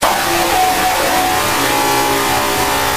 Jumpscare; alarm

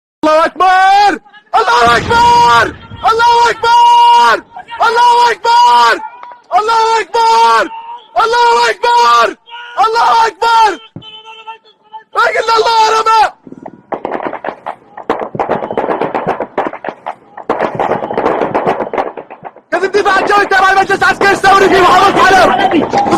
Allahu Akbar Scream Sound Effect

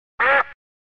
duck quack

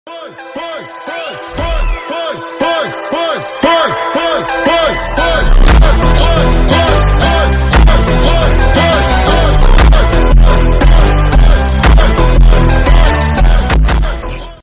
Phonk; YUTA takes over Gojos Body Edit

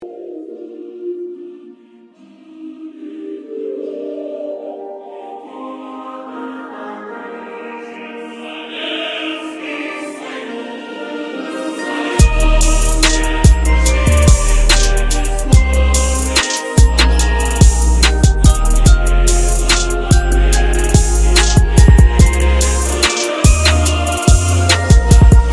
Soviet anthem trap

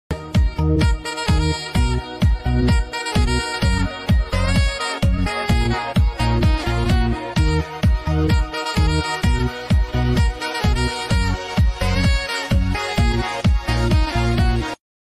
Saxophone; Lisa Simpson; Meme Soundboard; funny

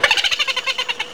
Laugh

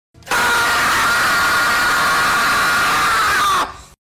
Scream Sound Effect; Tyler1

